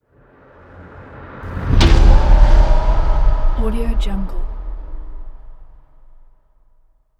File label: mystic trailer cinematic impact; anderington